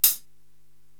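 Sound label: Hi Hat Closed; Korg TR-Rack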